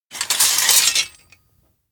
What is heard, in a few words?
rubble sword replica take; anemoi